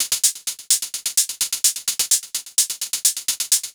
128 top loop; faze

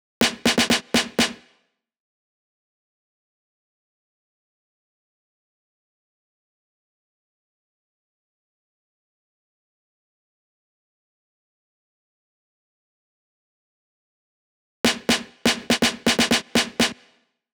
Snare Fill 123BPM G; Sprite Kit

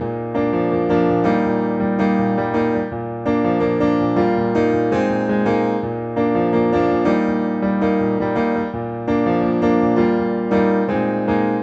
165, Bb, Piano

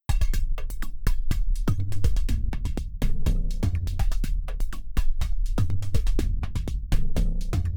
123 A- Synth

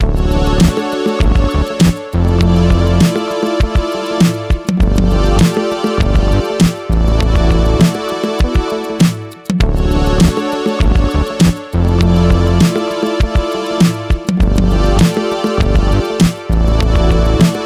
80s Analog Love

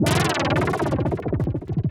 125 Fx Loop

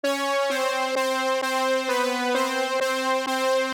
BRASS Trumpets

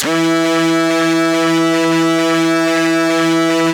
Synth Multis E2

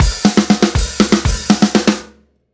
Fill 120bpm

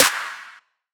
Snare

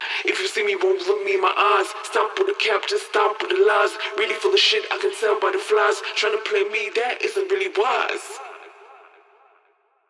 Phonk Vocal 117 BPM; Cymatics; Wise

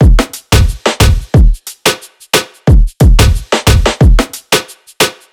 90 BPM Full Drum Loop

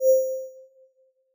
crush sine

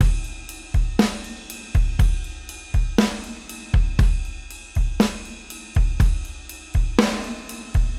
120 D Beat; Goblin

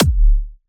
Drums Kick E flat

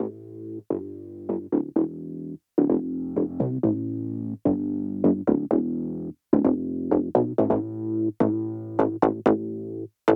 Mid Bass Build 128 BPM dry

